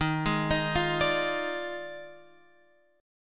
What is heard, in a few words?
D sharp Major 7th Suspended 2nd Suspended 4th Guitar Open F; D#M7sus2sus4; arpeggiated; x,10,8,10,8,9; chord shape